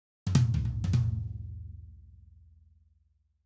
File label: toms